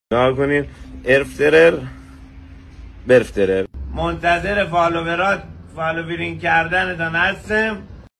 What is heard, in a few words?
Voice